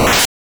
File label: cut, attack sound; カタナ１